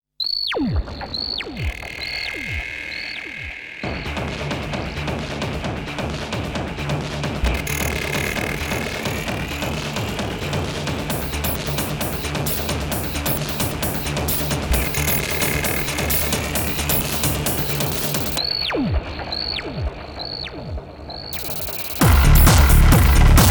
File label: bass machine music mix; monster train